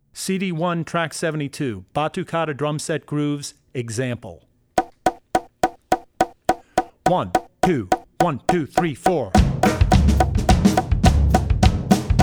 Batukada for Drum Set; Drummer's Bible Tracks

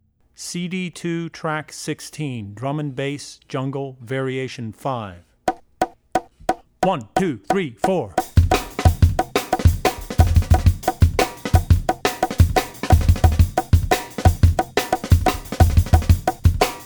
Drum & Bass Jungle; Jungle - Drum & Bass (Variation 5); Drummer's Bible Tracks

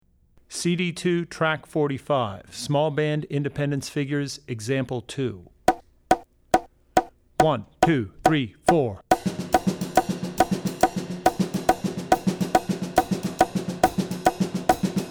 Jazz - Small Band; CD2 Track45 Jazz - Small Band (Example 2); Drummer's Bible Tracks

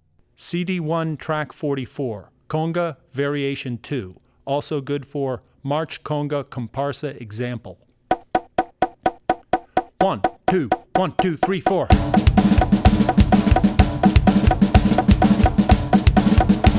Conga (Variation 2); Drummer's Bible Tracks

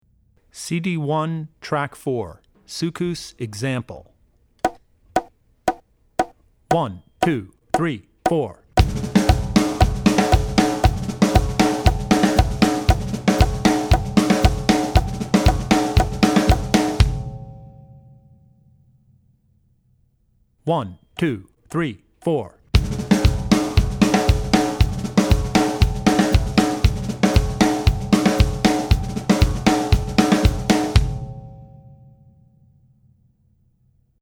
Soukous; Drummer's Bible Tracks

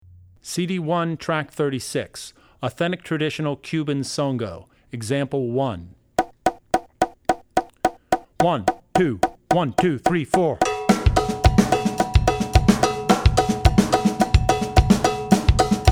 Songo (Traditional-1); Drummer's Bible Tracks

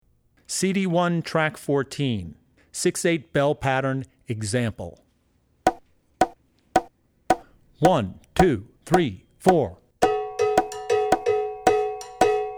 6 8 Bell Pattern; Drummer's Bible Tracks

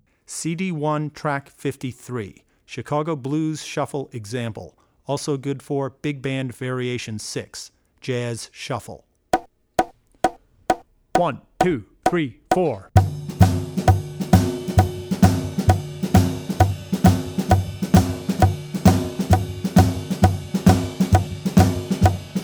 Chicago Shuffle; Drummer's Bible Tracks

